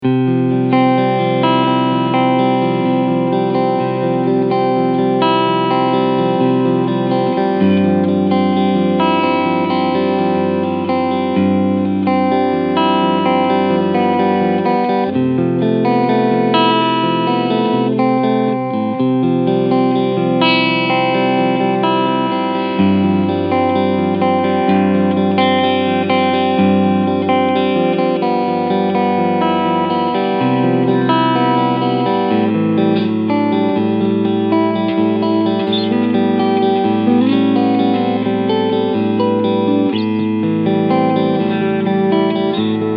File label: Chapman ML1 RS Cleansound Front Humbucker Singlecoil Mode; Rob Scallon